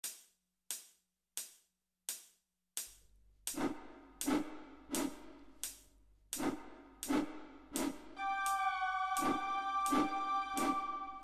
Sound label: Train whistle